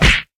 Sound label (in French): Coup de poing